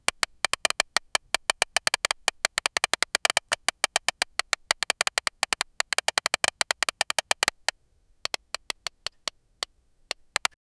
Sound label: clicking buttons on a retro gaming console